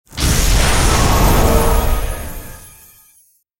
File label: Wizard Throws Fire Spell; Axial